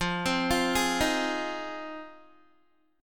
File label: F Minor 7th F Guitar; arpeggiated